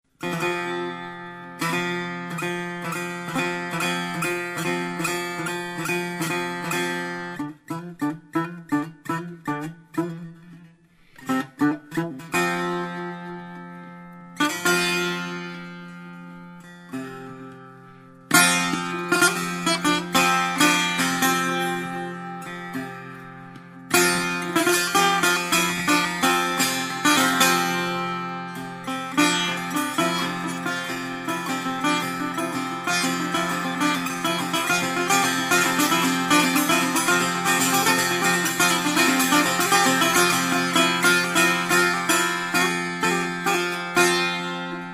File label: persian setar